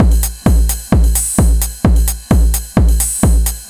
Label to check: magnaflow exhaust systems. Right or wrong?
Wrong